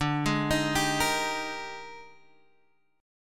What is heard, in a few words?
A sharp 13th